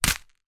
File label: foley paper scrunched up catch in hand